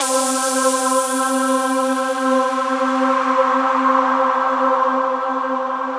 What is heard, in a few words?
VOICE PAD; Masterbits; Vintage Voltage